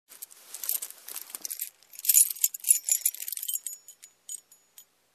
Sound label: Grabbing keys out of bag